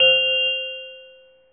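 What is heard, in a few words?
glockenspiel; Scene SE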